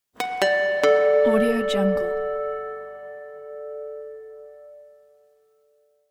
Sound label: gentle guitar transition